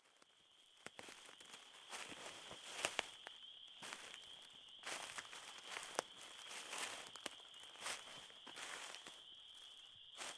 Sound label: Walkin' in the Woods; Wisconsin 2019